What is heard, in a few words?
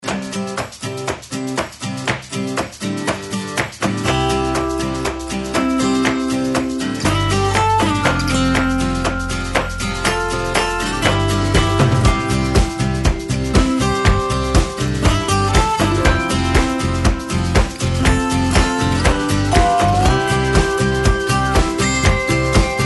country track